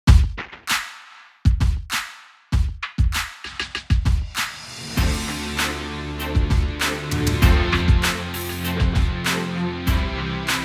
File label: Music; Trumpet Awards 2013